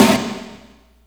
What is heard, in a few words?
SD, Dance Mega Drums; Best Service; SD-HIP